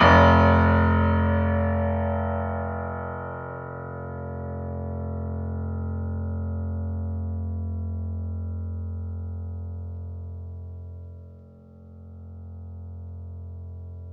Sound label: FF F 6, Grand Piano off mic